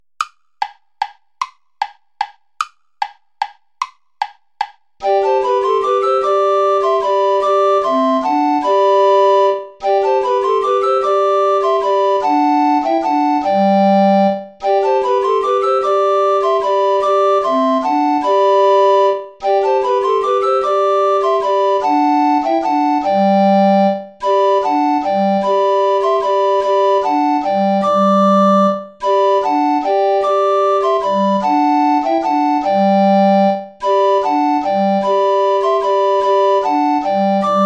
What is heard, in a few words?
volta play along without tenor recorder; praetorius michael